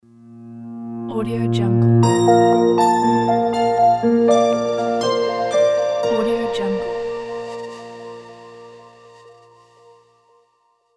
intro logo sound

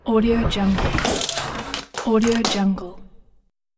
wood splitter; marburymedia

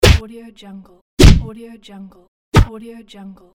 punches fisticuffs

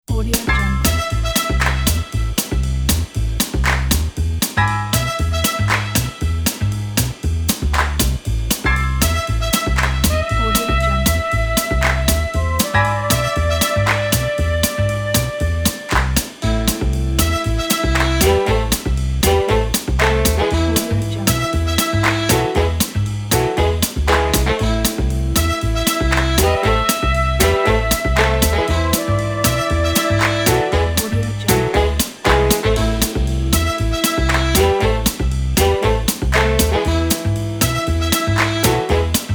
uptempo jazzy groove

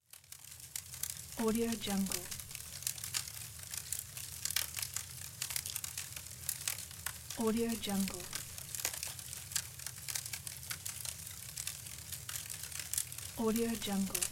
crackling fire outdoors

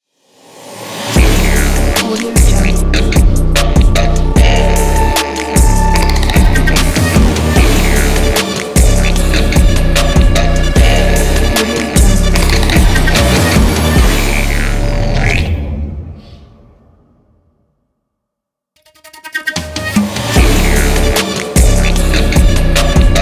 didgeridoo tribal trap logo

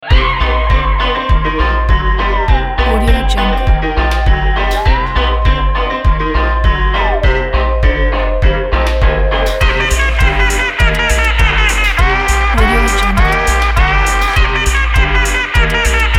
halloween electro swing; crispsound

